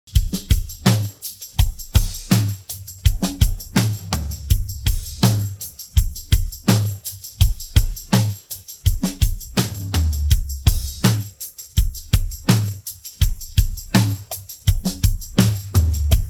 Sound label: drums pattern